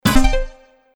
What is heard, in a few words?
match combo; Max Steel - Match And Destroy